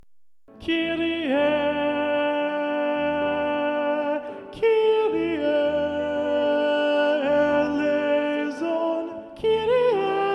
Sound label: alto